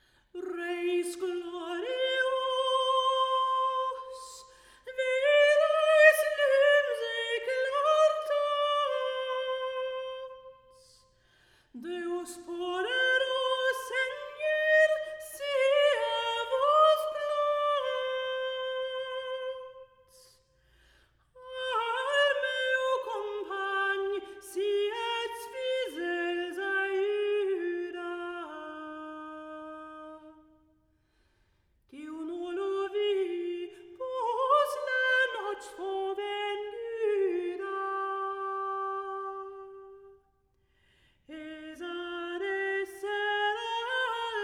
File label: Vocal, Soprano opera